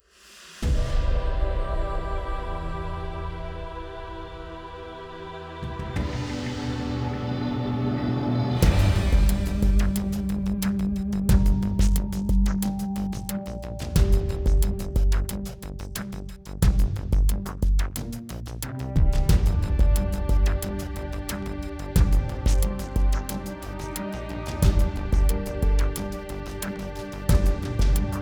Simple Techy Loop; bakugan champions of vestroia 2020; switch gamerip

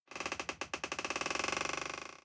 wood creak

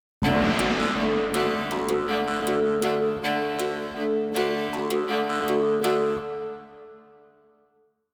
General Combat Transition Layer; Ard Skellig

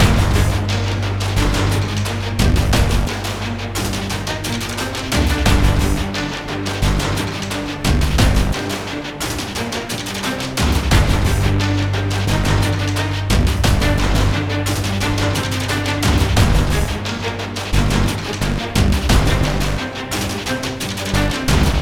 Drone Action High; modern combat 4 zero hour; android; Red Summit

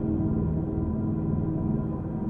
roomtone high; Adams Venture Origins

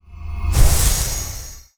light attack

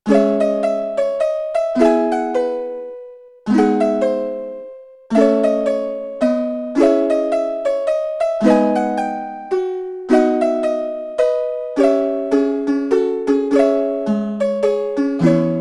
Harmony drunk; Musician; RuneScape 3

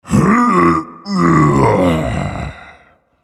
Vocal Efforts Medium; Minecraft Story Mode; Colossus

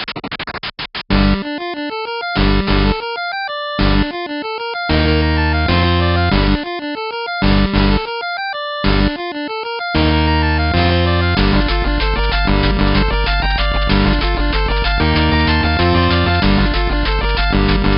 Mini Game Intro Music; CSR Racing 2

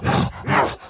Enraged Breathing Loop; The Town Sam Clip Burns; Devour 2021 gamerip pc macos

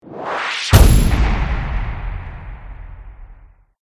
Combat Sting; Deus Ex Human Revolution

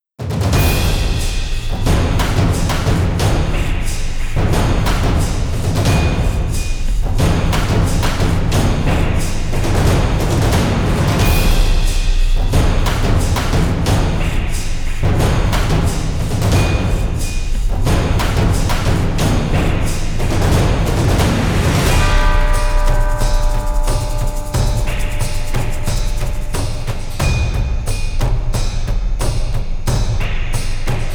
Combat Stem Drums; The Witcher 3 Wild Hunt; Toussaint; Q702 - Q704 Detlaff

